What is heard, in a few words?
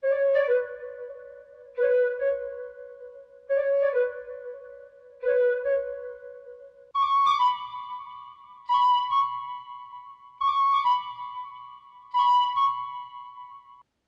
139 BPM B Min Flute, melody loop stem; Trap; Cymatics; 2022 Melody Collection - Trap Pt 2; Jet